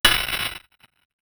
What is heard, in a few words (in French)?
un son de nova de, ice nova